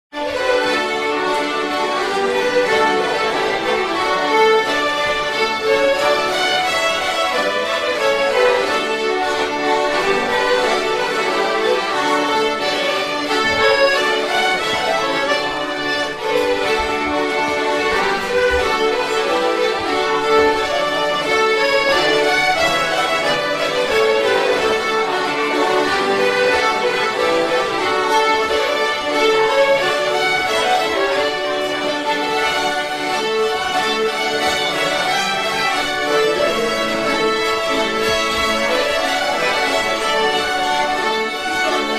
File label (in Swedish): polska; Einar Viklund